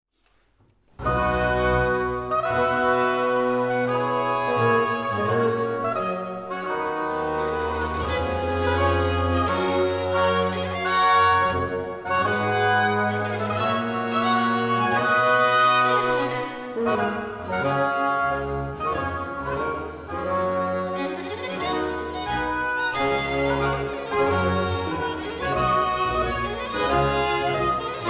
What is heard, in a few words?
Ouverture C Dur Allegro